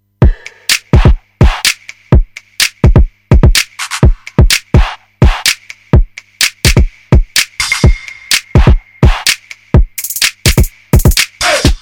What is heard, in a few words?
Beat; ZEN